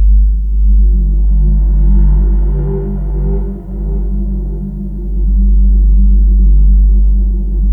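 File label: atmosphere; Sound Gallery - Charged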